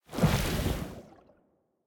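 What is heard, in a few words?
movement armor; smite; cthulhu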